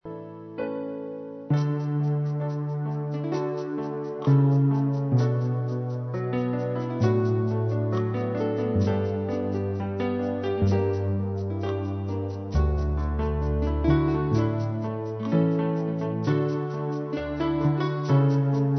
nothing deeper BACKING

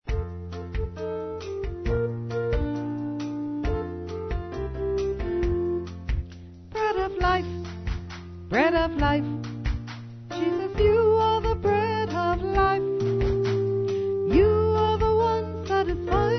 Bread of life VOCAL

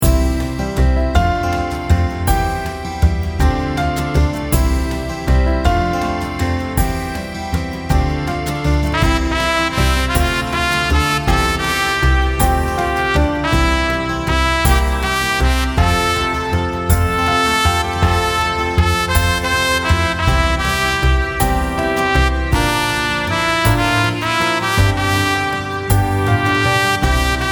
BACKING

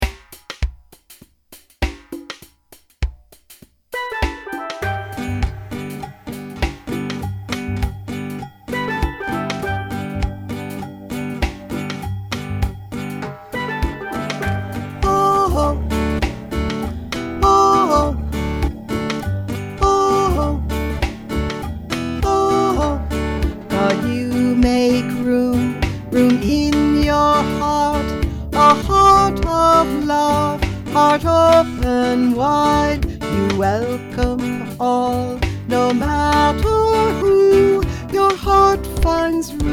God You Make Room VOCAL G

